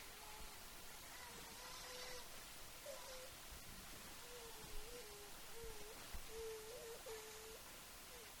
Fussy Baby

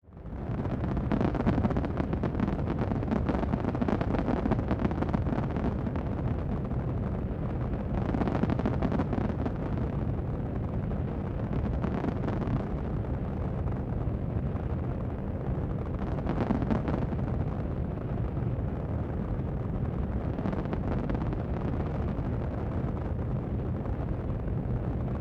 Rocket Roar Very Distant Steady Crackle

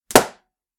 Balloon Burst

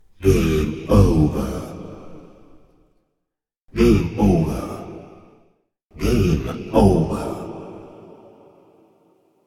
Game Over

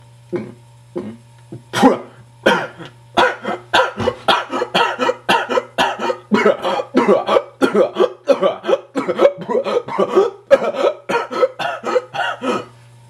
Exaggerated Drink And Choke

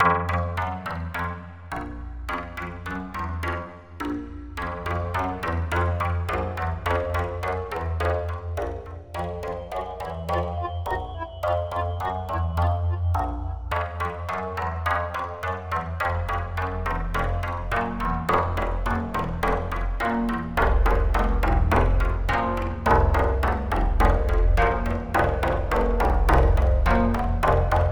Strange Beat

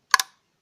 Kodak Brownie Shutter Sound

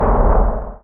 Big Stone Door Suddenly Slamming Shut

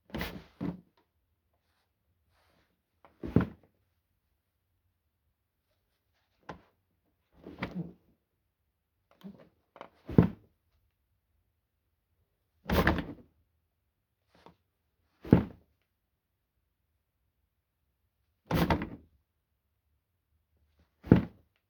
Sitting Up And Down On Office Chair

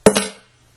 Key Drop

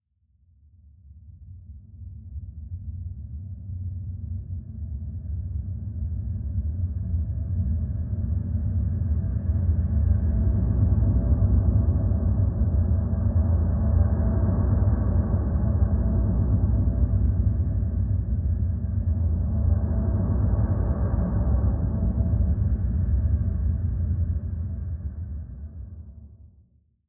Large Vessel

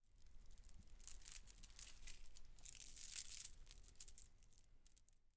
Rubbing Pearls

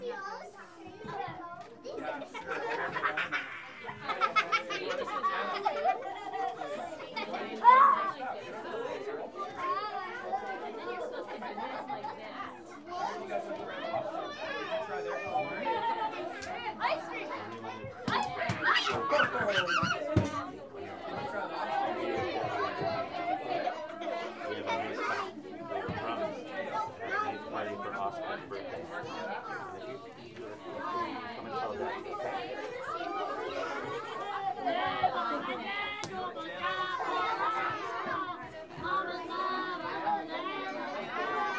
Kids Birthday Party Crowd

